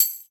Tambourine

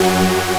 synth; osu

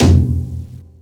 soft hitfinish

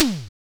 normal hit clap; osu

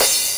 cymbal; osu

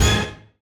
funny orchestra hit (g#)

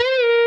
x x 15 bend full release

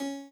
chord

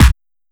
Clap; osu

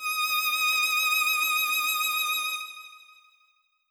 violin

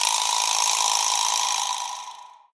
vibraslap

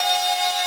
synth main

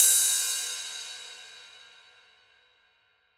Ride

